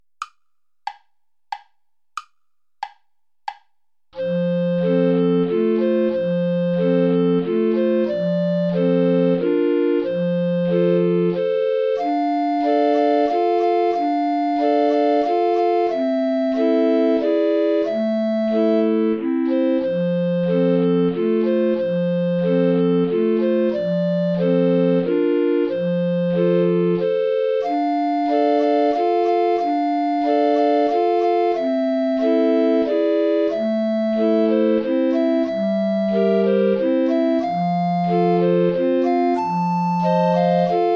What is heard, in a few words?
waltz play along without soprano recorder